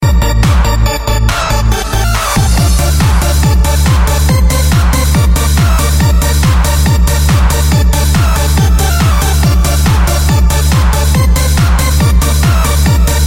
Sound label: Trance